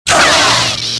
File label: saber block